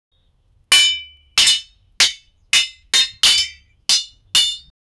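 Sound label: clashing swords and muffled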